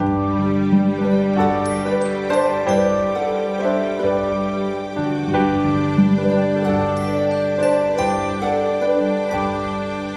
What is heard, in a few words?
Chime Cycle